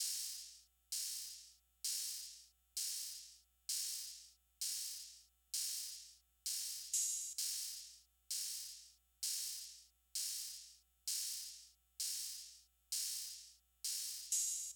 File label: Open Hats